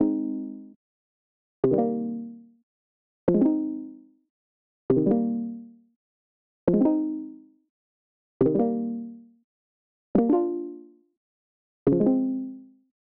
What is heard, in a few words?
Keys